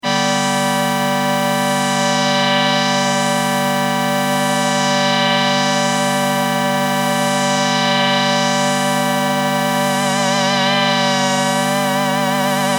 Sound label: pitchmod vibrato